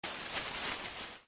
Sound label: leaves rustling sound effect